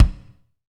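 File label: bass drum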